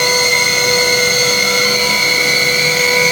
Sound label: SPACE; Northstar; The Wizard VOL-1; TERROSTROIKA